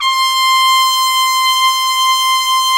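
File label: Solo Brass trumpet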